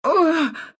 pain, ru

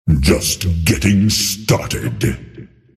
Attack pain; Earthshaker; arcana

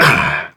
Grunt Attack; Paladins; Owl

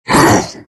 Shards pain; Terrorblade

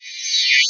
Break Tether

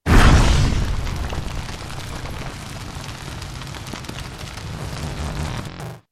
Meteor Hammer; Dota 2

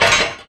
Clockwerk foley

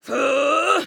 Grunt Attack; Flak; Paladins

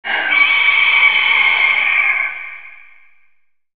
phoenix bird victory

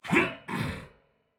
Assassin Grunt Jump; Chained One; Realm Royale

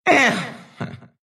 move pain; Dota 2

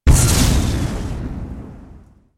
Chain Lightning; Mjollnir

